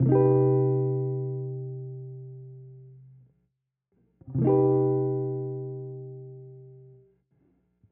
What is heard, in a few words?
color Cm Maj